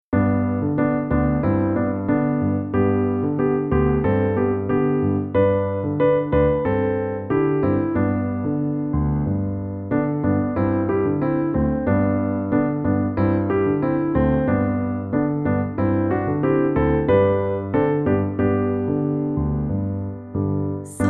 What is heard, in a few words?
hymn